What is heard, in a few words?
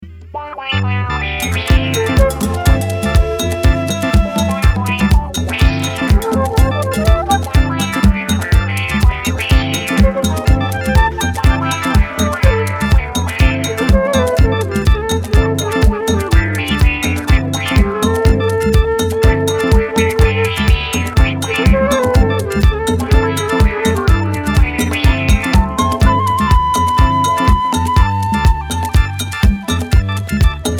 Yoruba Soul INSTRUMENTAL mix